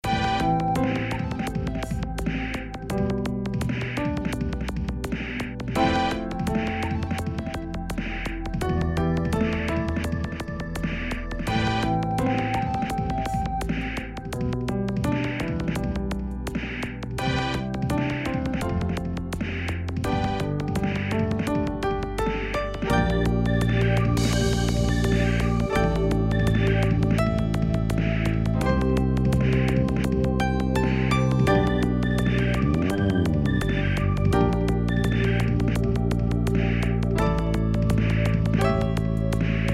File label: SNES Instrumental Remix; Outlaw Star; SupremeMIDI